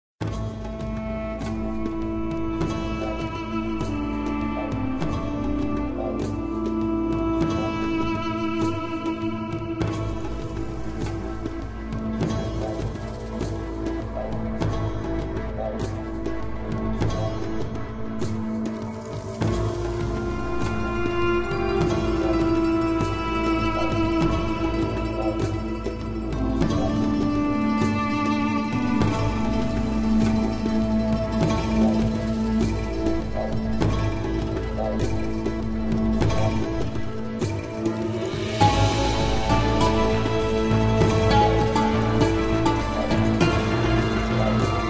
map sound

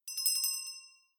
bell; vis center; Toki Tori Collection

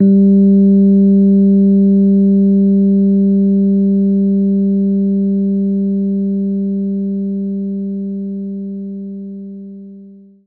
Rhodes G2

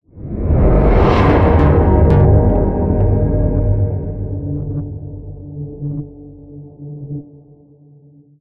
Transition End; Everspace 2

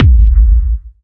Kick Hard pcm Hard bass drum

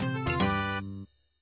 Music New topping; papas cupcakeria online windows gamerip 2013

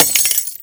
Light Bulb

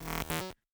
Glitch

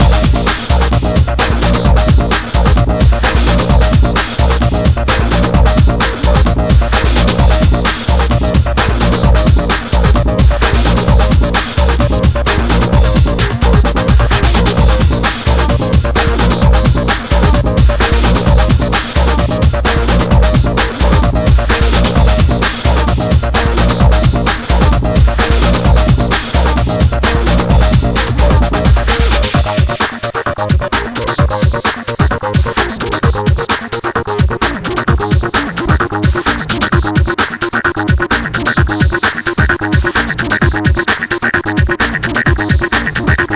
Pluck

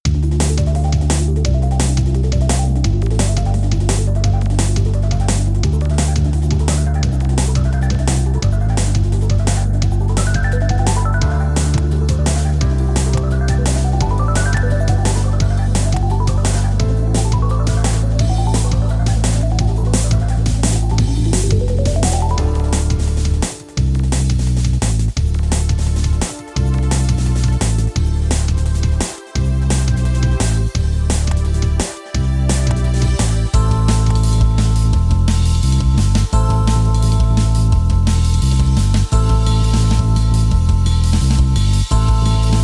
Drum & Bass

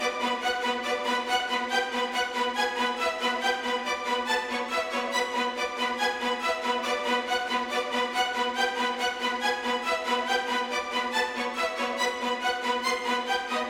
string Stac; Sky Diving

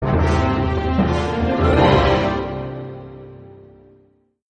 level complete sound; Arc of Templar

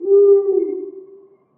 Owl Hoot G

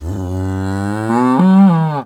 Cow Moo; gamerip; American Fugitive

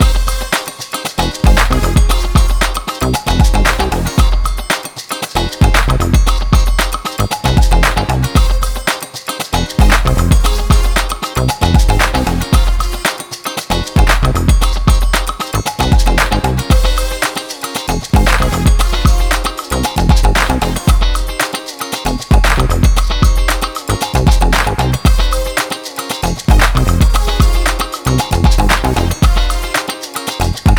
Bonus Loop

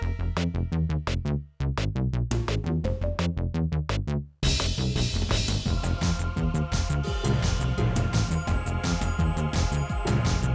Hi; Plants vs. Zombies Battle for Neighborville; DreadWoodQuestOneMain

